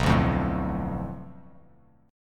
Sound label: B Minor 7th Flat 5th B Bass Standard, chord; Bm7b5; strummed; JGuitar